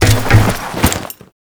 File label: APC Mount Foley; Warframe; Lasrian